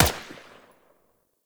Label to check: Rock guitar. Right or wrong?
Wrong